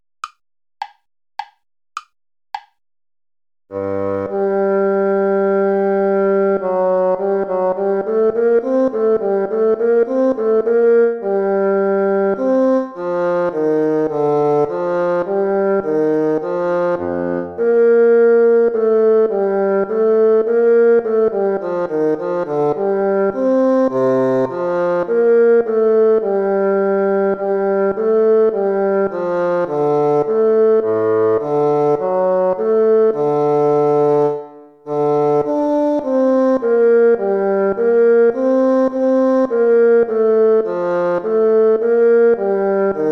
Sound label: courante play along; haendel georg friedrich